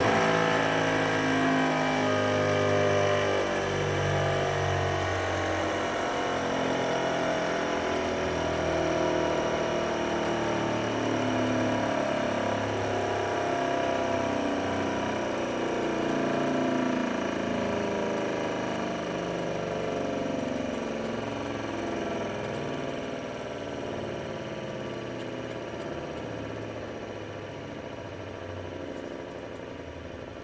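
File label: Grinding Wheel Motor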